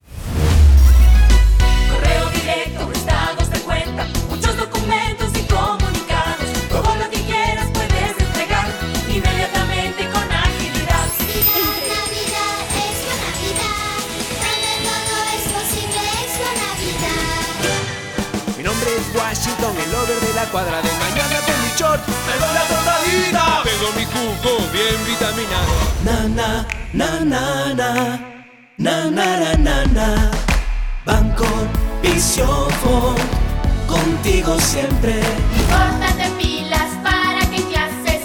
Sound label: Jingles, radio